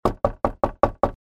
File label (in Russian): voprositelno; Звук стука в дверь